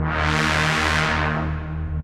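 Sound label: Distorted Synth Brass swell; instrumente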